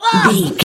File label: voice female hurt pain choke